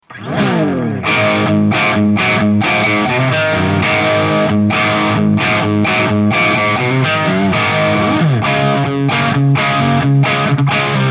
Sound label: lp crunch